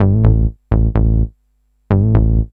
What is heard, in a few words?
bass, Roots Funk; USB Soundscan vol.41; AKAI